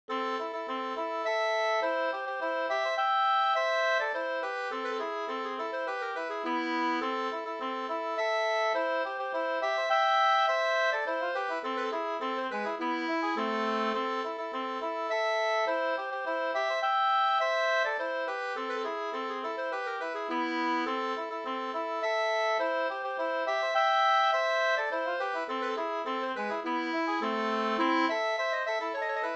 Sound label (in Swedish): slängpolska